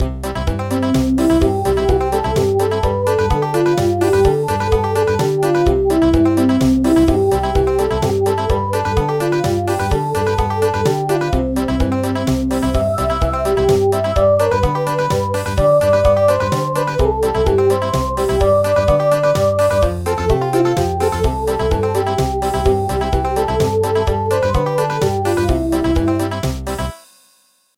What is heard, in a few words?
Country